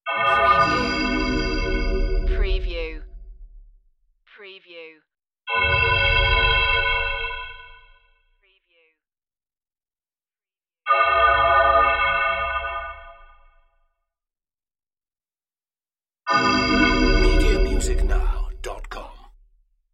magic transform powerups; Magic / Spells / Fantasy

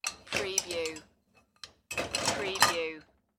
Dishwasher Load Dish

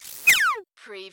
CARTOON ZIP AWAY

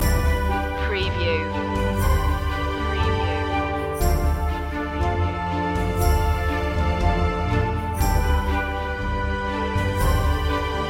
blooming spring short loop

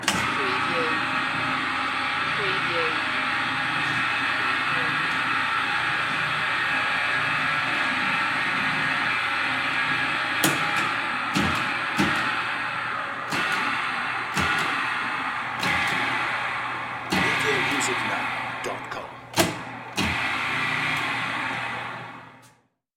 Food Processor

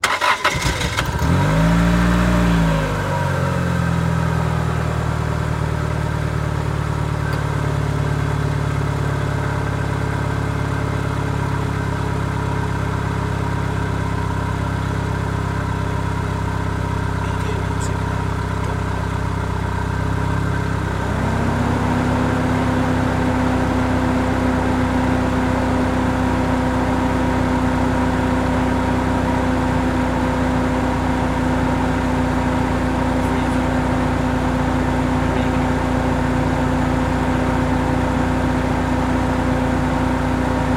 Auto Start Idle Off; sound effects bible

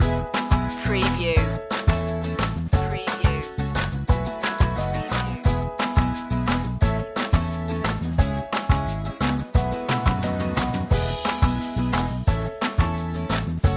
pop loop

